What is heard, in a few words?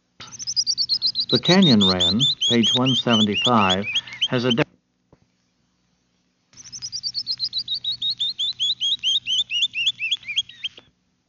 canyon wren